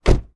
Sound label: door close; Golden Crayon Sounds